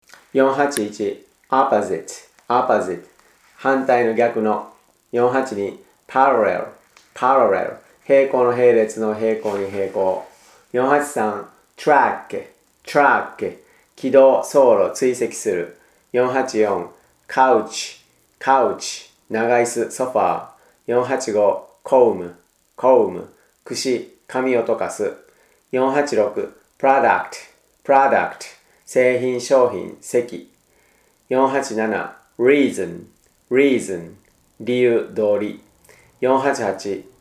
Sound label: tango